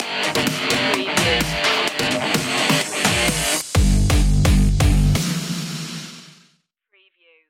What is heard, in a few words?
rock rush stinger